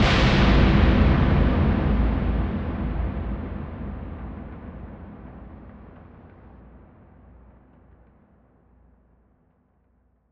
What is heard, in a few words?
slam